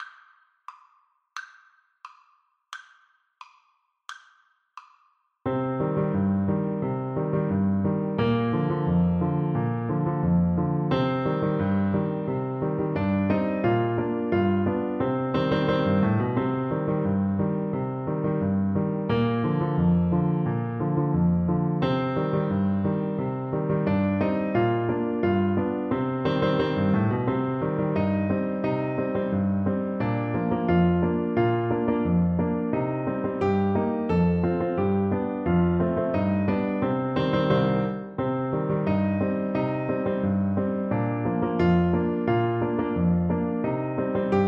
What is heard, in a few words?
trumpet